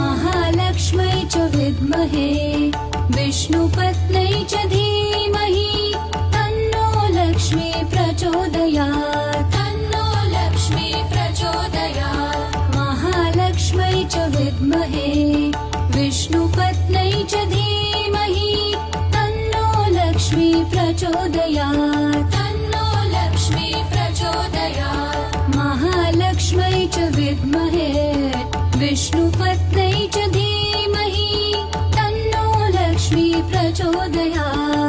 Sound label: Sri Lakshmi Gayatri Mantra, Durga Devotional